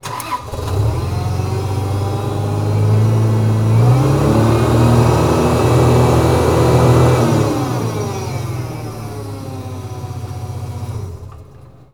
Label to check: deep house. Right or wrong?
Wrong